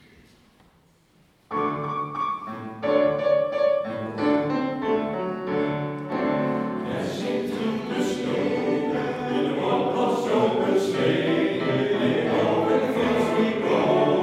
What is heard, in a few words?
Jingle Bells; kerstconcert 2024